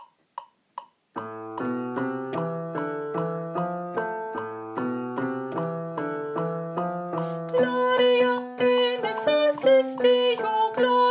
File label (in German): Gloria, Bass; Bass (Teil 1); Messe; Chor